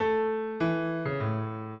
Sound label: piano; Mozart